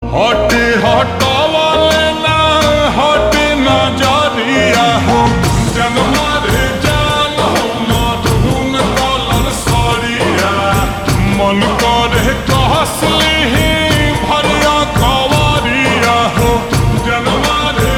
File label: Ringtone Slowed Reverb